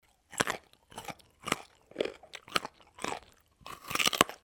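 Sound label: carrot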